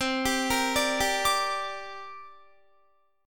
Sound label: C 7th Suspended 2nd C down Guitar; C7sus2 arpeggiated